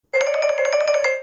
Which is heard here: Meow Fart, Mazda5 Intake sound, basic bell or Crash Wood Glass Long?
basic bell